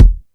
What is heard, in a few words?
short kickdrum e key, Royality free kick tuned to the E note; Loudest frequency: 113Hz